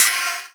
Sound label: Open HH, Cymbals